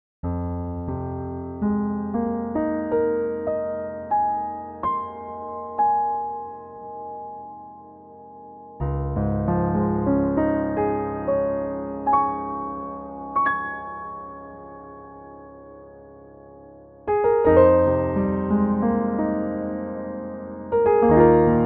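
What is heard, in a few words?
Close n sweet, Pianoteq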